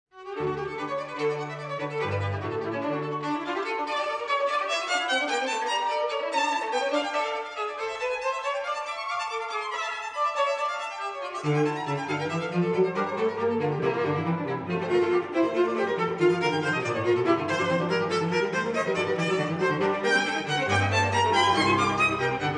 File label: String Quartet In D, Finale Vivace; Op. 64/5; H 363; Lark